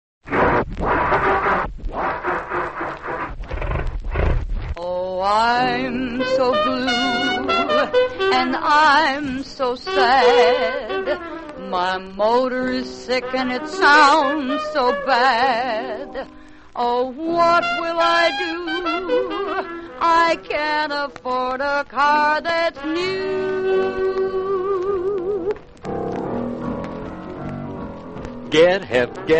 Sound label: radio jingles; texas motor exchange; allied radio artists